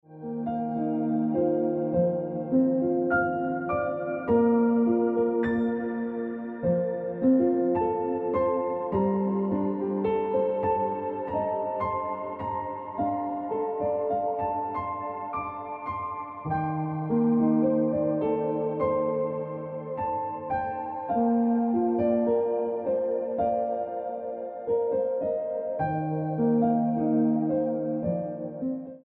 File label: Piano Music